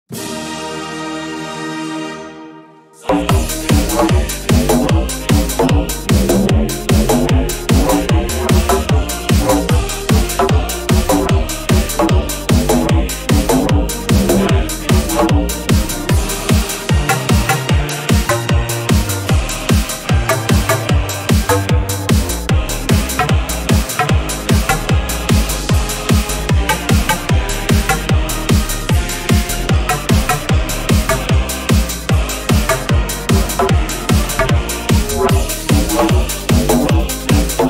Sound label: Hardbass